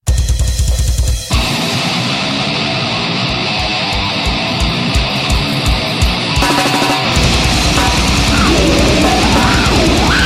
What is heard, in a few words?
Widchimes And Happy